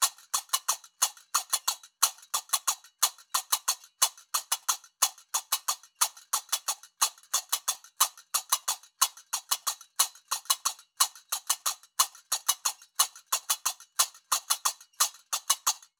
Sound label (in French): loop ternaire tempo 120; Qaraqab; qraqeb; Maroc